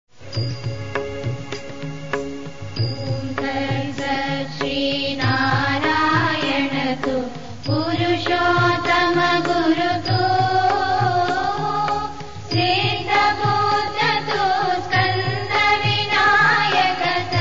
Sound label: Hindu Chant preghiera universale